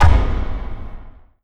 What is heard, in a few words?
click back, ui menu